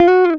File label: GTR TRIK, Jazzistic; Univers Sons